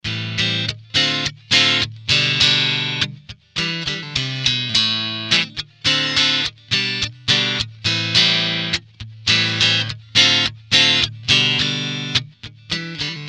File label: neck clean; steel foundry